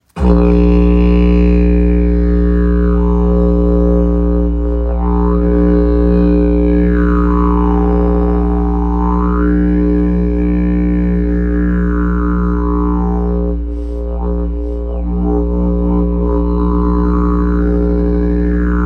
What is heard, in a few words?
Didgeridoo sound